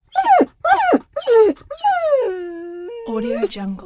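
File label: dog whine nervous australian shepherd; smartsoundfx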